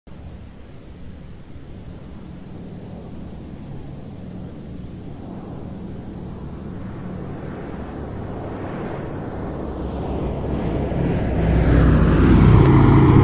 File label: flight scene